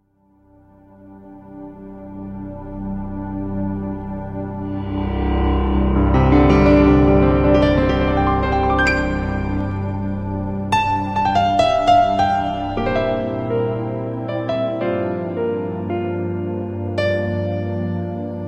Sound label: christmas jazz classics; Weihnachtspraesente